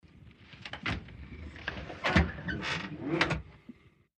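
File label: British Standard Call Box Door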